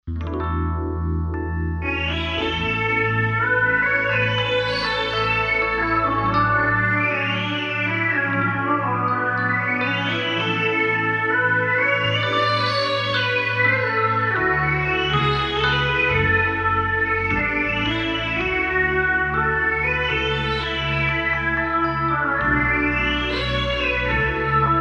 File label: rhodes minimoog oberheim ob3